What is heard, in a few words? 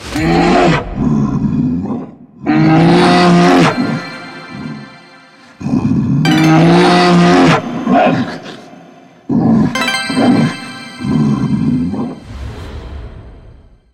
mega win; In The Forest; Wazdan